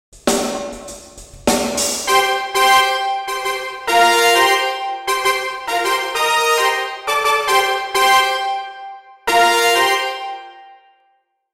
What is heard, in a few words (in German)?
Trommelschlag Fanfare